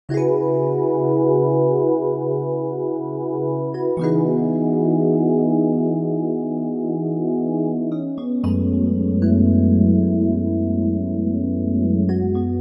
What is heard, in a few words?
relax music